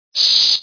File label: fuse lite; Super Mario 63